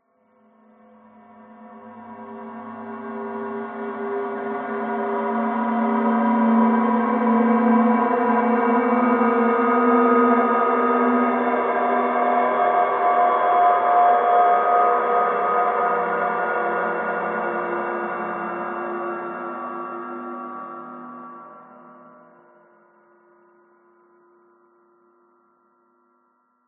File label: swell transition slow cold metallic left right resonant; sound design accent; dissonant; Skyclad Sound